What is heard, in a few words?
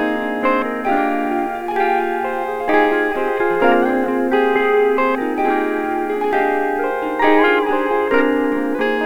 floating